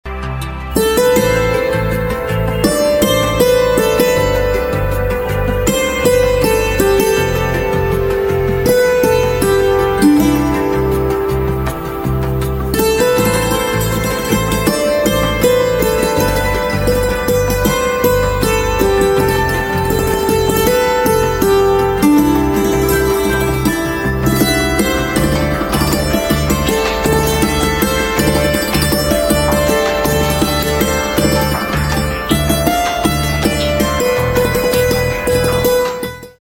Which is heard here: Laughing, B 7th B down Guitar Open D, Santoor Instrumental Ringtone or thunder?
Santoor Instrumental Ringtone